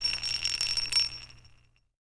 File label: Tactics Enemy Suspicious